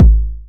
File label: 909 BD; Goldbaby Tape808 & Tape909; The Tape 909